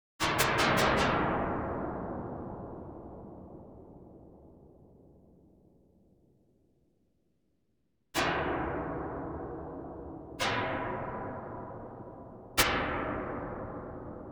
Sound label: noise binaural; residency iem 21